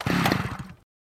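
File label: Chainsaw Pull Start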